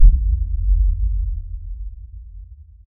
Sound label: cinematic sub hit